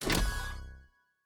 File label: module new epic